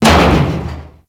Arachnoid Camper Step Metal Debris; Warframe; Corpus

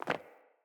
Sandals Land Default, Footsteps; Player

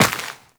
Step Dirt, Footsteps, Walk; Warframe; Tenno

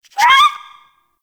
Rabbit Ambient Call; Warframe; Duviri

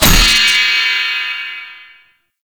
orb shot start; Duviri

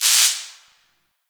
Destruction Electricity Sparks; Corpus Railjack

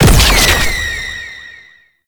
Infested Lich Summon Tentacles Cast; Warframe; Abilities; Zeke

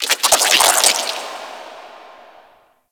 Ocean Underwater Plant Chatter; Warframe; Grineer Ocean

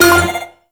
moto race countdown; Warframe; Motorcycle Racing

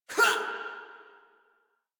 Pain Female, Battle Barks; Warframe; Modular Corpus Female

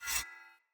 Dagger Whoosh Accent; Weapons; Swords

